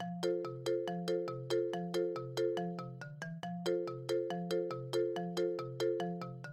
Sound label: Loop I-V